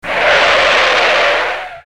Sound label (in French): Dérapage